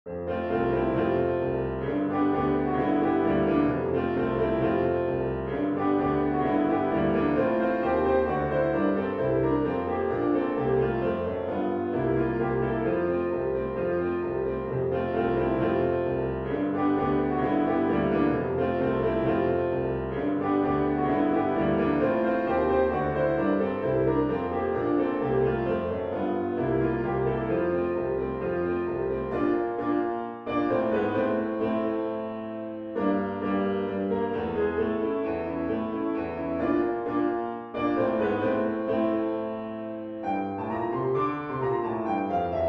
Little Samba

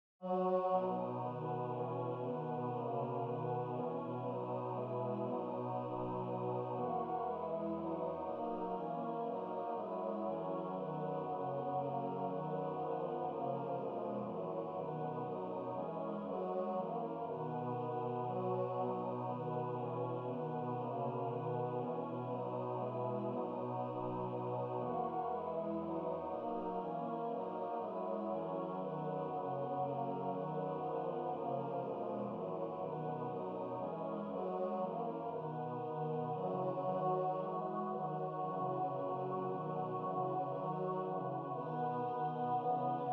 Choir

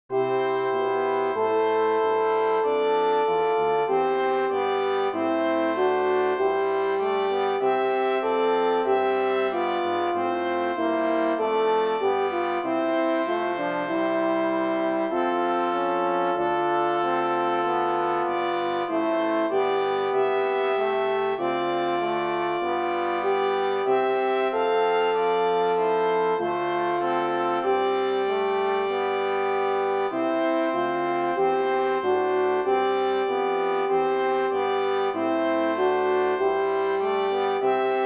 Brass; Vidas' harmonization exercises

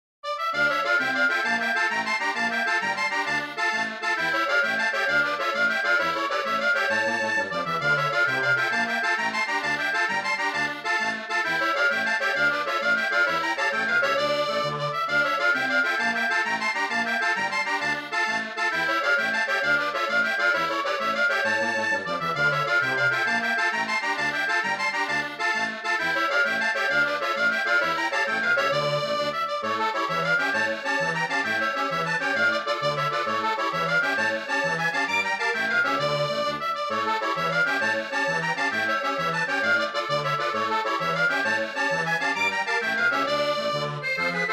Tarantella

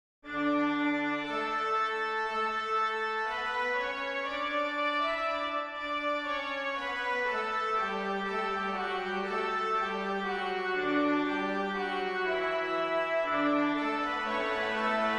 Tutti

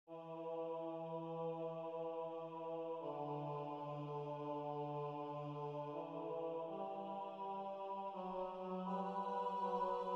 SATB

